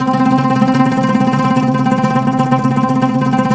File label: OUD TREM C 2; Soundscan vol.53; Mediteranean Traditions; AKAI